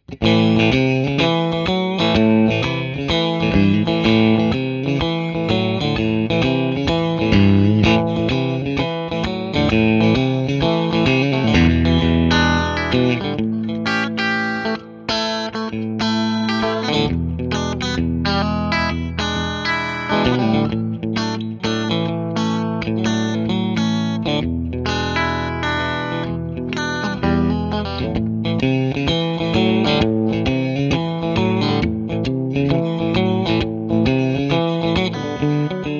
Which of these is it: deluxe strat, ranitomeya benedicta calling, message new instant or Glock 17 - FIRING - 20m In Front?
deluxe strat